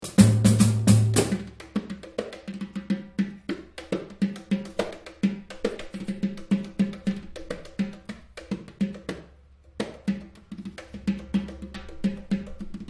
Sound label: repique de mao